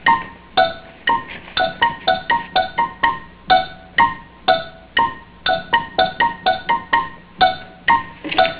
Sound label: plink music; bradybunch